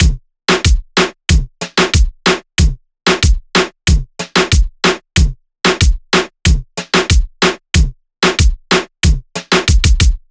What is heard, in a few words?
Drum Loop 93 BPM (Latin); Cymatics; PARADOX II; Drum Loop Collection